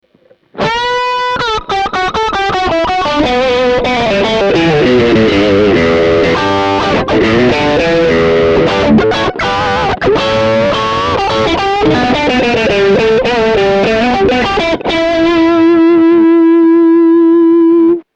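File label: Fender Przester MS, classic strat humbucker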